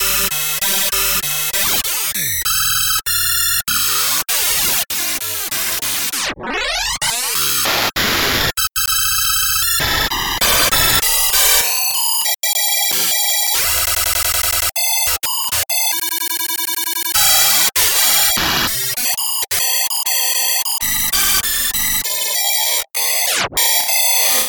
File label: glitch experiment